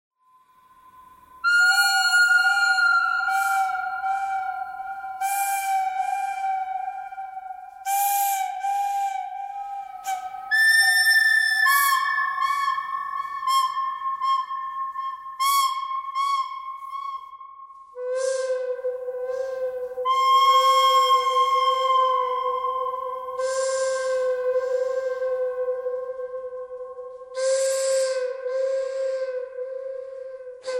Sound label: bird canyon bird cry pentatonic